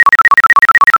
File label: TONE; cuddle n care